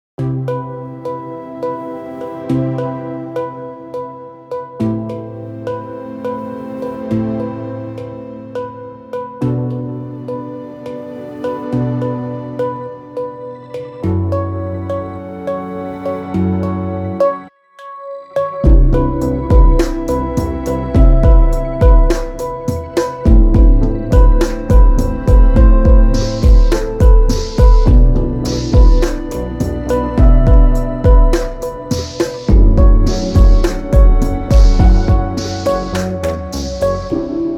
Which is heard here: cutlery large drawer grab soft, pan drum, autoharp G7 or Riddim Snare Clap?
pan drum